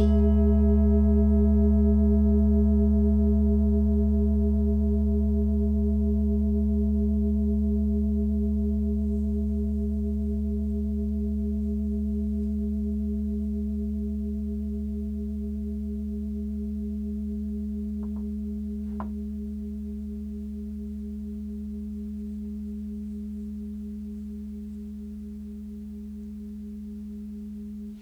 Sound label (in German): klangschale nepal, Klangschalen Nepal